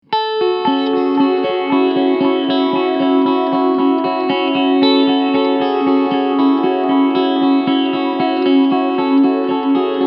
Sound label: Clean Phaser Analog Delay; Mooer Hornet 30 Preset; Fender